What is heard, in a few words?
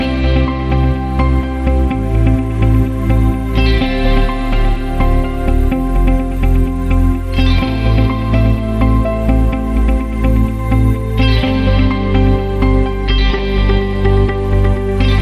Inspiration No Drums